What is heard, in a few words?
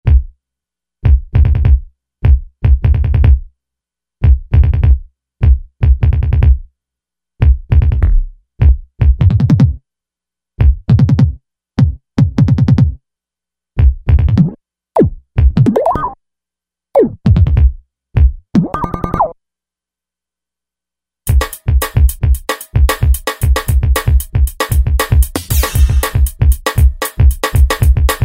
Glitch KIT, Roland V-Synth; Factory Patches